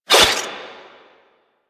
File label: Rising Blades Whoosh; Warframe; Warframes; Pax Duviricus